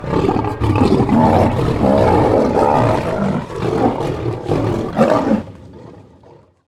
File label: lion battle